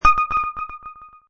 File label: Ping, Sci-fi ping. Sonar, computer or machine; Science Fiction Sound Effects